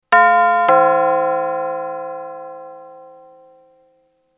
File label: Doorbell ring sound